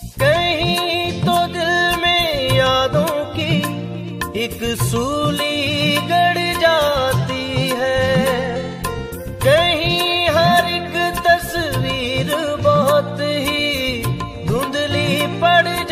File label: Old Hindi Song